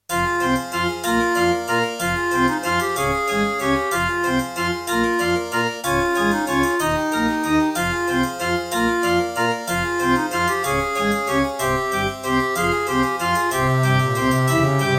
Soundfont; EmperorGrieferus 131MB Soundfont; Death Gate - Track 6

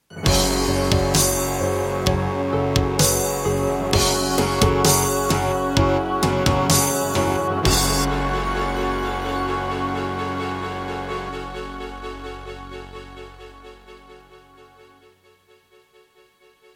Introduction, Pine PT-2030 Vivaldi Xtra Wavetable; Doom (Sigil)

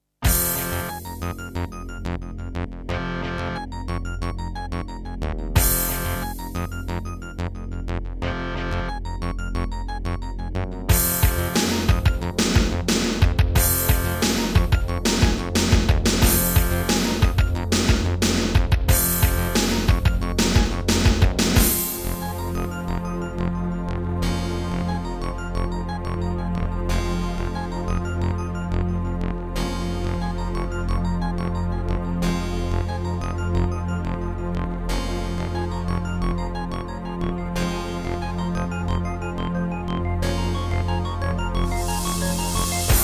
Terratec MiniWaveSystem SOWT-11; sigil doom episode 5

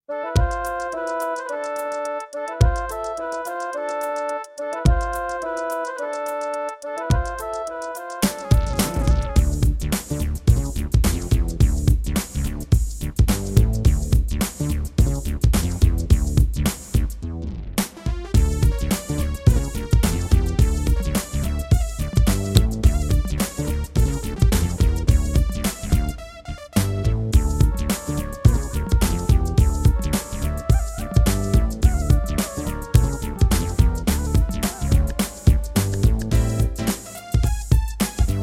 Yamaha MU80 GSMODE

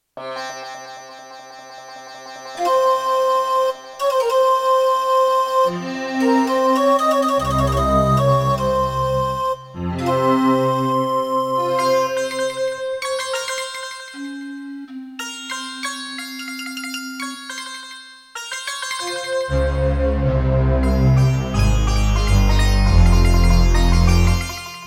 MidiPlus MiniEngine USB; Prince of Persia 2

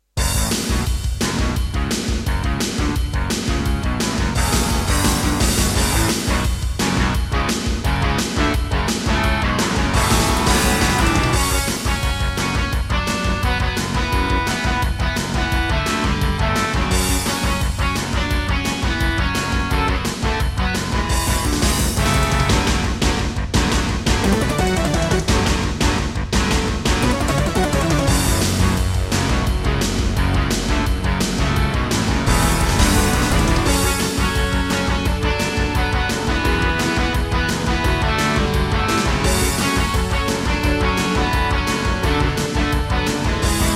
Intro, Roland SC-88 Pro 55 MAP; Duke Nukem 3D

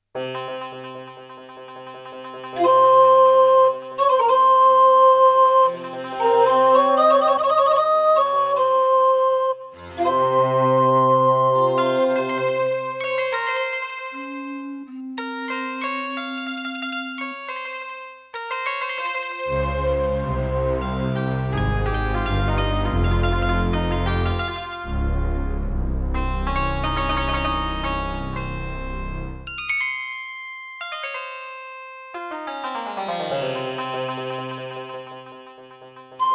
Boss Dr. Synth DS-330; Prince of Persia 2

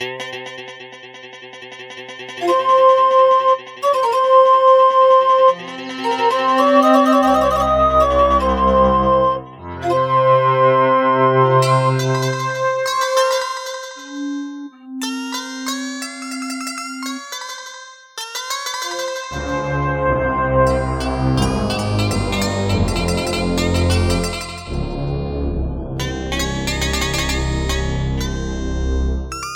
Casio LD-80; Prince of Persia 2 - Intro

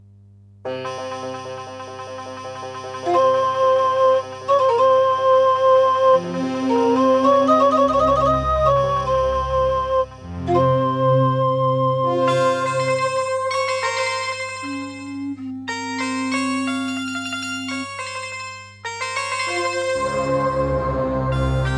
Diamond Monster Sound 2MB; Prince of Persia 2 - Intro

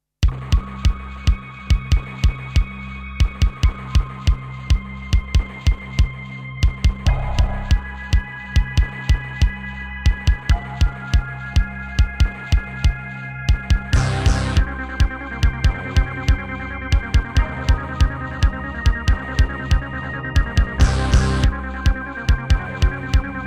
Doepfer GMX-1; sigil doom episode 5

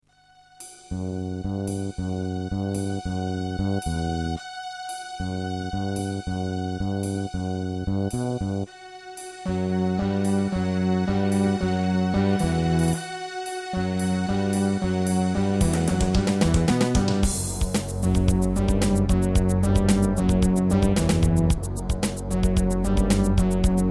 Terratec MiniWaveSystem TTWT; Duke Nukem 3D; Stalker

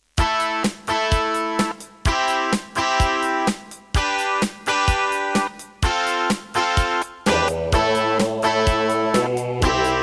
Roland SC-88 Pro; raptor call of the shadows

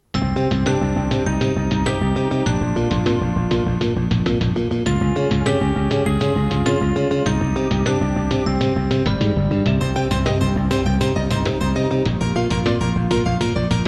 Creative Sound Blaster AWE64 Gold; Hocus Pocus

